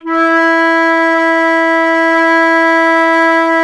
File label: FLT, WOODWINDS; Roland - LA Composer vol. 1